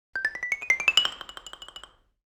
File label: xylophone riff